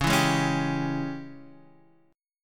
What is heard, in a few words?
Ab Major 7th Suspended 4th Sharp 5th Db